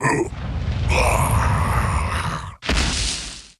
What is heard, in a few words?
Daemon Death; puzzle chronicles 2010; gamerip pc ps3 psp ds x360